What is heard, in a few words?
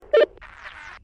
radio beep; Combine